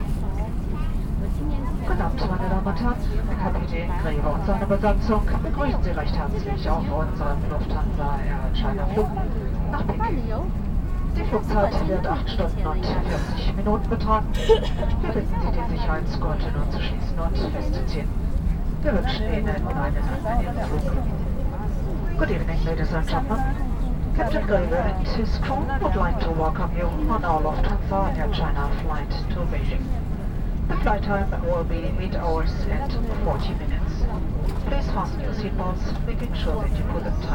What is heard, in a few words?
Airplane Takeoff Ambience; Frankfurt To Beijing; Sound Scapes